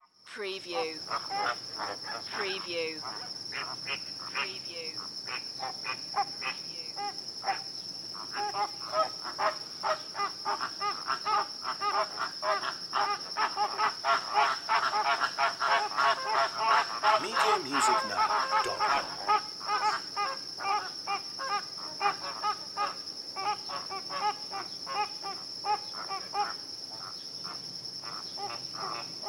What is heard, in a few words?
Wetland Flamingoes; Sound Effects Bible